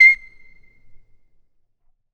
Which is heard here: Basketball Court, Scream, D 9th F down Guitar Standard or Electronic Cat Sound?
Basketball Court